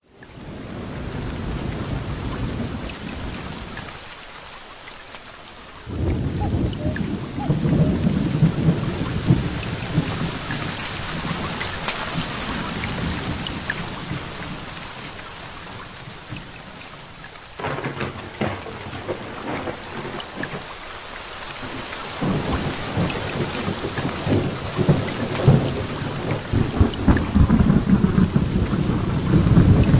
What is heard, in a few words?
Tropical Storm